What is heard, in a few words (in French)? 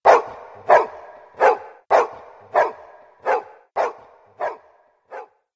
chien